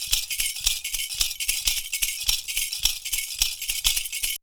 PERCU; Univers Sons; Raricussions

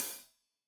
Hat Loose, Hi-Hat, Cymbals Stereo

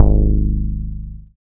BAS MKS-50, Roland

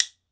WOOD BONE, Celtic Percussion

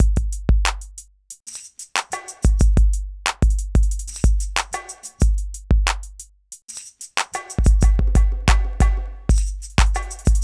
Drums, Hip Hop; Sonic Foundry (Sony Creative Software); Lukecage Underground Soundlab Hip-Hop

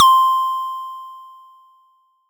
SY TAMBI; ModelCycles

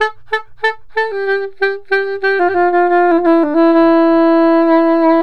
SAX, Balkanish Traditions

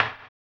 HAMBONE, Vocal Blues Soul; Spectrasonics; Planet

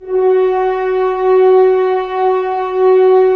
Horns; Roland - LA Composer vol. 4; HZ Horns

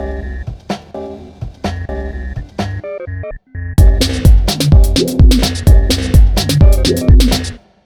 127, Disco House; USB Soundscan vol.20; Fresh Disco House I; AKAI

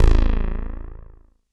JUNO BASS

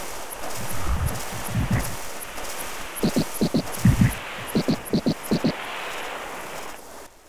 loop, techno atmos

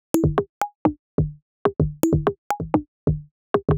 Wander Top C# 127bpm, House Drumloops; 90 s Sample CDs; Zero-G House Fabrik Drumloops; ZG Drumloops

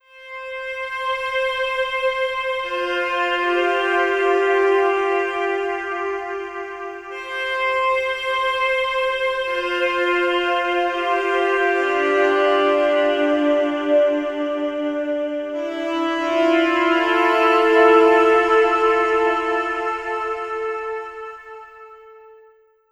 Sax; Sonic Foundry (Sony Creative Software); Slow Silhouette

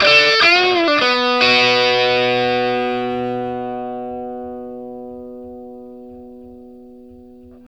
Vintage Blues Guitar, 60, B; USB Soundscan vol.22; AKAI

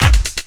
COMBI, Houseworx; Ueberschall; Workz-9

